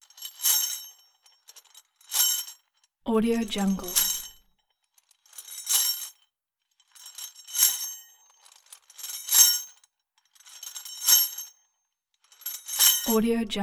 chains footsteps

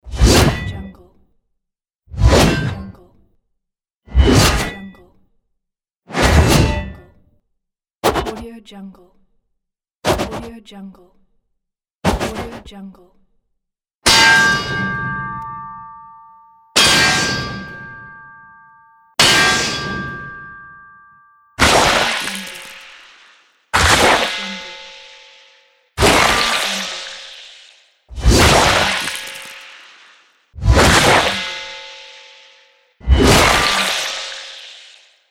weapon axe; weapon axe pack

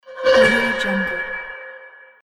dark metallic transition

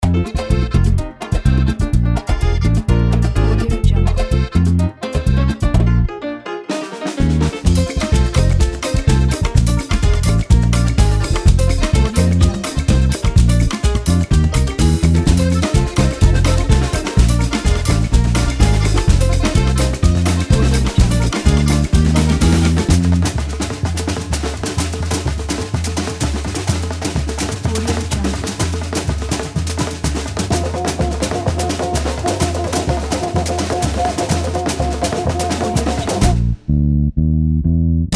latin fiesta

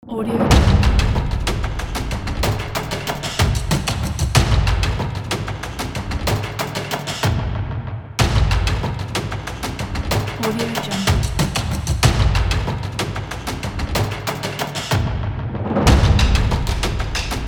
african safari drums

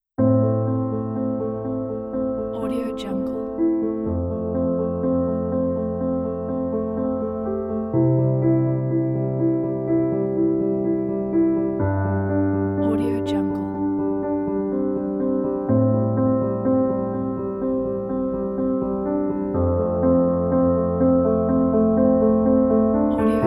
emotional piano background

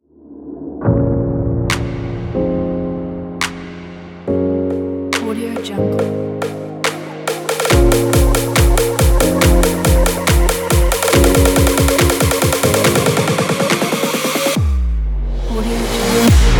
all future bass

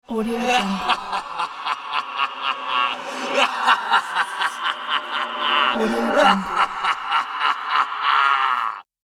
evil laugh

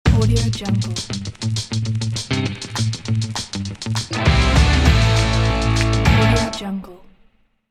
upbeat indie rock logo; sviridpavlov

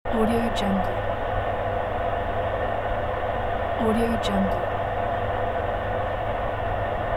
ventilation noise loop

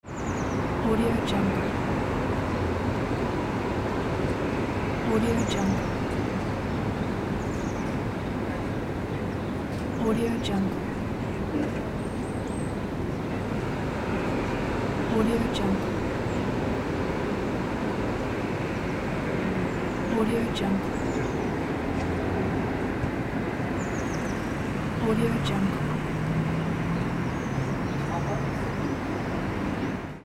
city background noise

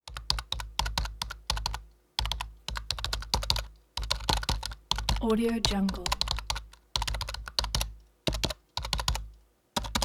keyboard typing fast

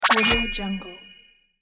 game rapid reward

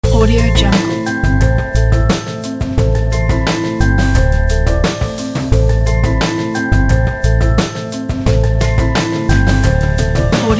chill opener intro logo

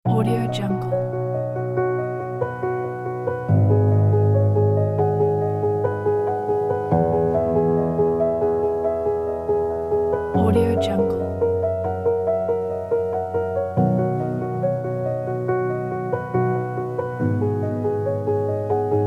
the hopeful piano

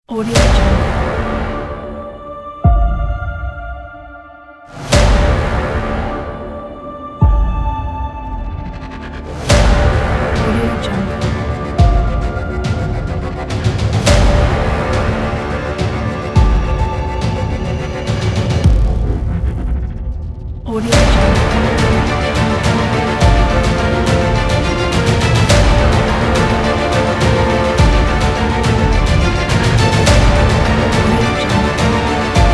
epic trailer

